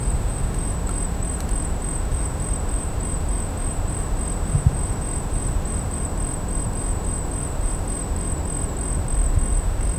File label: evening fairy forest